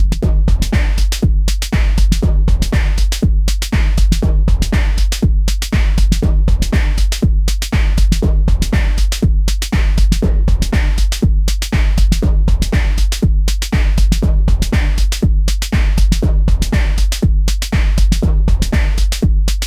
disco drums with chorus

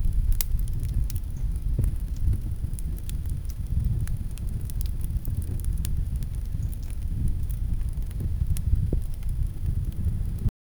fire crackling with faint

